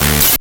Distorted Reality; Spectrasonic; DIGITERROR

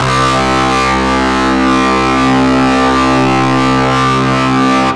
SYN BASS

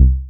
Soft Bass, FM; Polyend

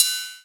Ride, Roland R-8

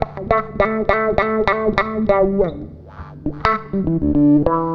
WAH, WAHWAHGTR; USB Soundscan vol.31; Miro s Grooves; AKAI

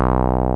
303 MID, BASSES; Pro-Rec - Synthsonic

